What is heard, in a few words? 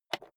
rotary control single turn soft